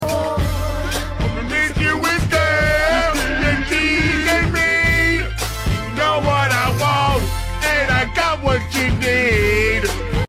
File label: singing his heart out